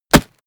punch mid tight

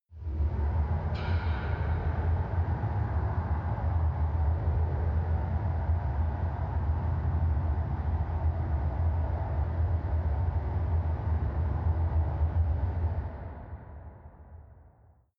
machine room drone metal click

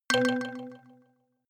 notification kalimba delay octave low

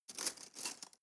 cutlery teaspoon drawer handle

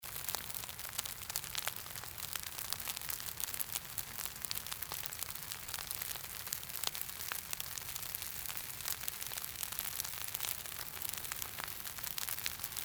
crackling fire

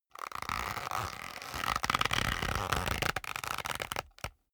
leather creak long soft

